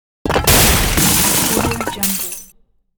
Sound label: destruction